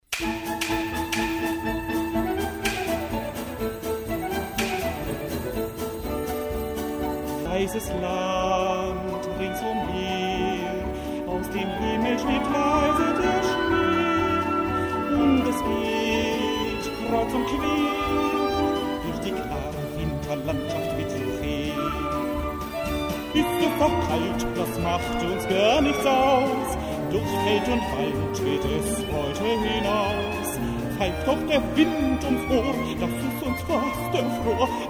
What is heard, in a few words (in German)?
Schlittenfahrt